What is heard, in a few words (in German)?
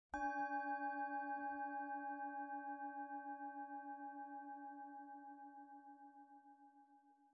Mars-Klangschale Ø 10,9 cm; Planetenschale; 100-180 Gramm inkl. Klöppel